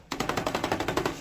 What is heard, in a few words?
brush; bob ross